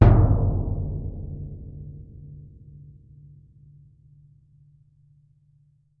BD2, Percussion

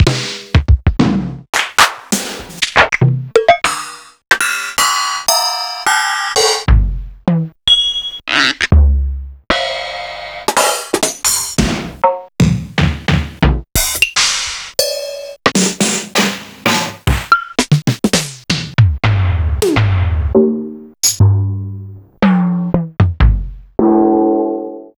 Fairlight CMI IIx

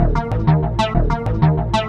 Swing Jirk, Bass; Sonic Foundry (Sony Creative Software); Synchro Funk

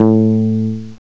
ELEBASS; CMI Sound Library Rev 1.3

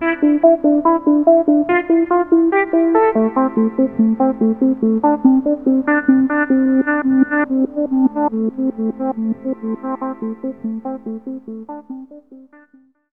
DR. LOOP, TRANCE; X-Static Goldmine 3

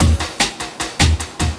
LOOP, 150 BPM, Drum & Bass

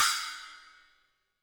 HiHat & Crash, Roland TD-20; HiHats

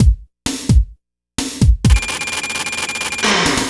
80s Funk Beat, Drumloops; Dr. Fink's Funk Factory

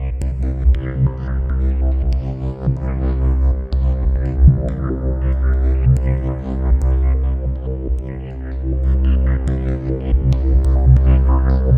C1, SynthLine; NiceBeats

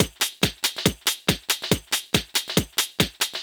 Addon Loop, Drum Loops 140bpm, Perc Loops

